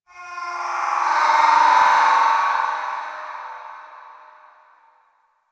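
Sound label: Investigate Diorama Scream; Warframe; Cephalon Weave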